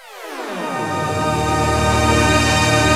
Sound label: SYNTHX, STEREO FX; Greytsounds VOL-1